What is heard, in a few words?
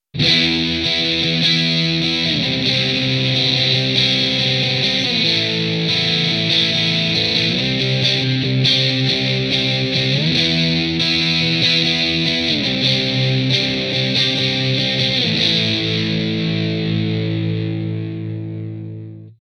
Maxon SM9Pro+ bypass